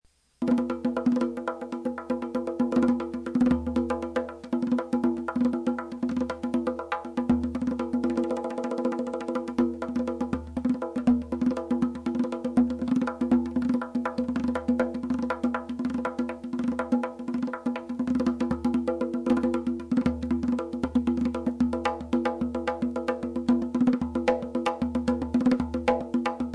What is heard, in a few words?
zarb; drums; Vince Plays some of his favorite drums